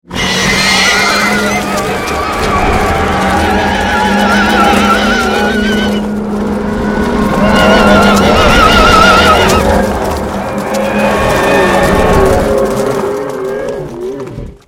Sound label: Demon, Horses, Hooves, Creatures, Horror; 5.1